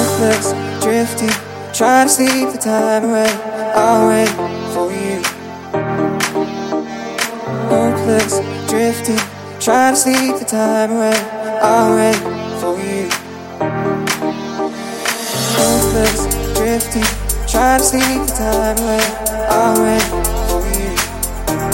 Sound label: English Ringtone